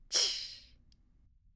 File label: UI Sounds Tsss